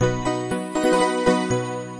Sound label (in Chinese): 背包 游戏成功